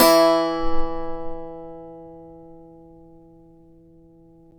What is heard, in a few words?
SAZ; Roland - LA Composer vol. 3